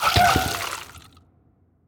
spitter death; creatures

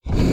flamethrower start; fight

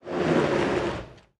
tank brakes; fight

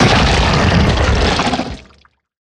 worm death big

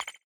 gui green confirm; Factorio

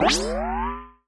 nightvision on; Factorio

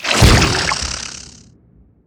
spitter death behemoth, creatures

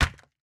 build concrete medium; Factorio

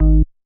bass, programmable speaker

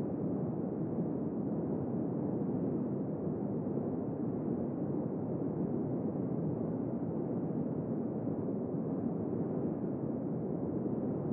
world base wind; Factorio

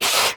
biter roar, creatures

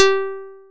plucked, programmable speaker

